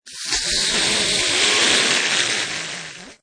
Young alien hatchling moster creature: Hissing scre; threatening hiss or screeching from snake like fantas